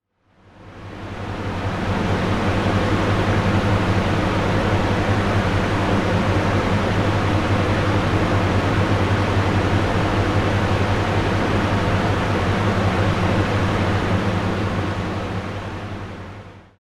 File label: Binaural Beats Box Fans